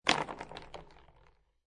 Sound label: Billiard Pool Snooker Billiard Ball Falls Into Pocket